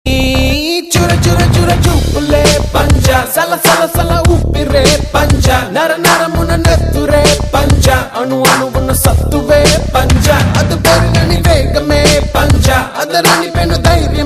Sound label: Music Ringtone; Panja